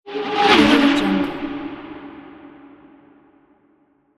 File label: race car pass by; mvpsfx